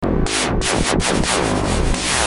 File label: noise